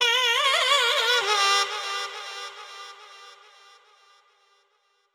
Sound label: Shehanai